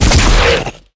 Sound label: Tongue Grab Attack; Evolve; Behemoth